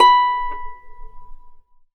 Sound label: B5 HARP; USB Soundscan vol.62; AKAI